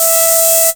CREEPY E4, sequencer; Best Service Alpha Dance vol.2

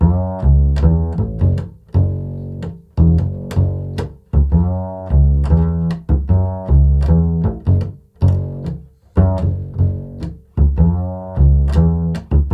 CITY F#, Bass, INNERCITY; Spectrasonics; Bass Legends

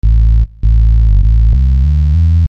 Hard Synth Bass; Construction Kit; HIP-HOP; Sonic Foundry (Sony Creative Software)

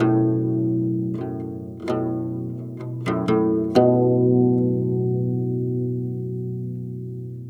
Guzheng Poly-Rhythm; Continental Drift Aftershocks

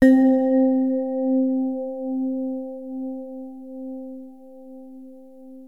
Access Virus B; Synthworx

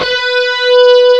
guitar B4; AKAI S-Series CD-ROM Sound Library VOL-8; SET#1 GUITAR

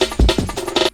LOOP SD, Drum & Bass, 160 BPM; Ueberschall; Drum & Bass Resonance

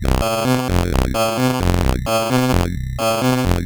Sh101 Loop, 130BPM

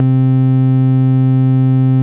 bass, Dance Mega Hip Hop Electro; EastWest

